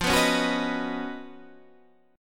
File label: Db 11th F, guitar